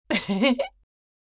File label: Elderly woman giggle; laugh